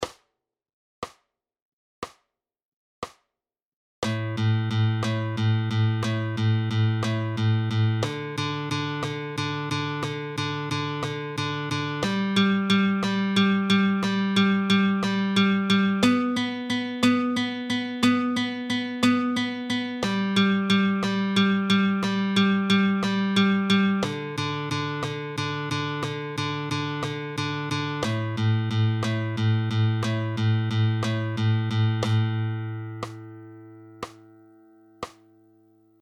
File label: Alzapua